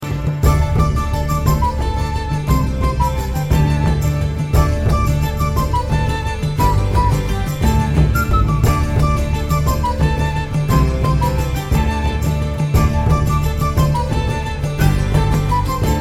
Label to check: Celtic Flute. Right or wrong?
Right